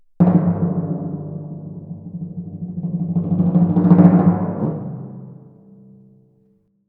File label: Timpani, Jazz for piano